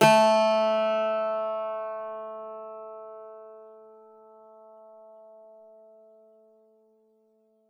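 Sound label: bouzouki A3; SoundBytes; Early Patches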